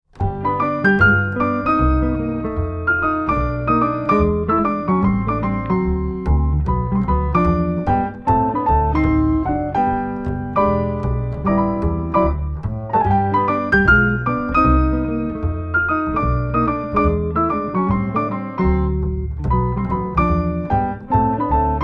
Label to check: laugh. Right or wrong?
Wrong